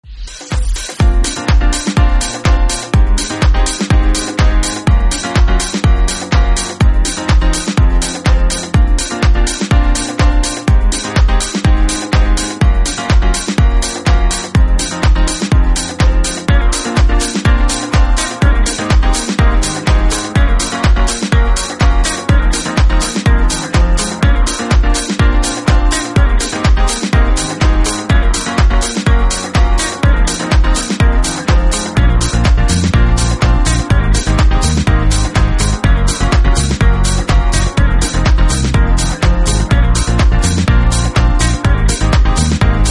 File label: House Dub